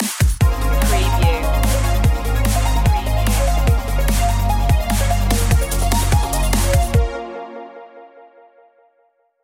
biodigital future stinger

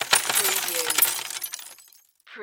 Debris Glass Drop; sound-effects-bible

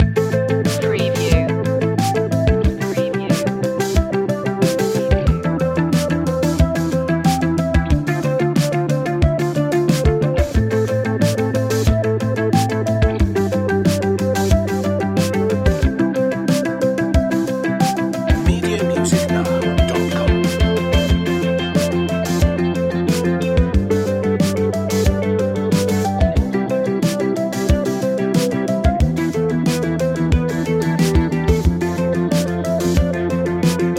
analog epic loop

